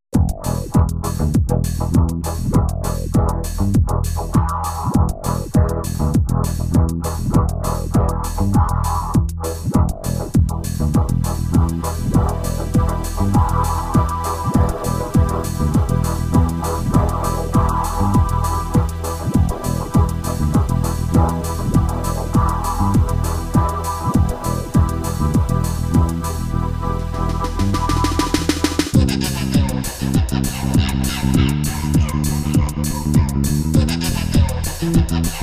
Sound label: Atmospheric